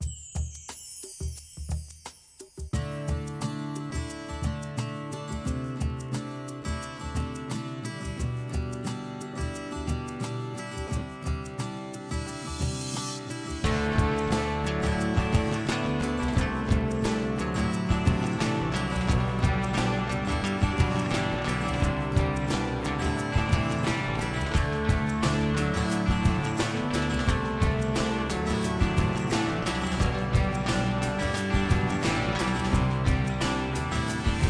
Rock

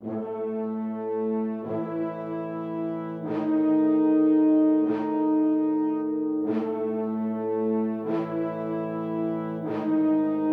Music Protection Brass; 7 Mages

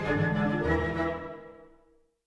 Music Dialogue Suspense End 50bpm

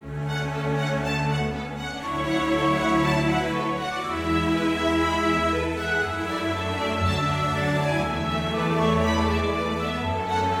happy forte

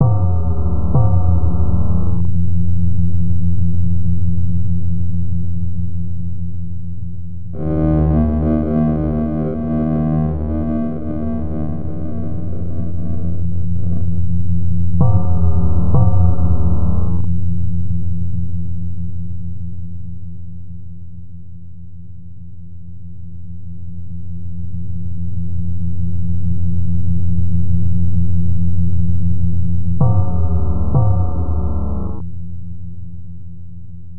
Club ambience; Cruelty Squad

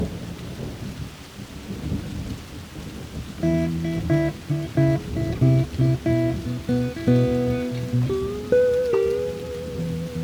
MUSIC blues light rain; barnyard; playstation 2

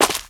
Steps

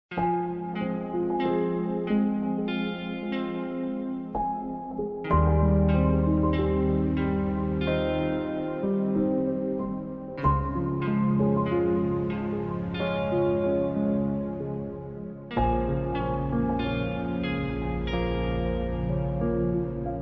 Slowed; Garten of Banban 6

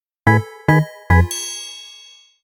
Lose; Mushroom Hills; Mini Mario & Friends: amiibo Challenge

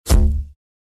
Notification Ricochet; Tanki Online

